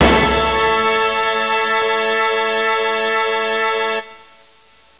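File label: FANFARE A, orchestra hits; MUSIC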